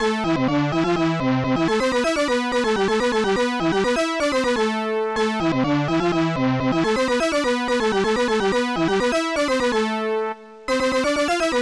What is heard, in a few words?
lacucaracha; chaneques